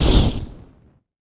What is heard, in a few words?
Hellfire Cannon Shoot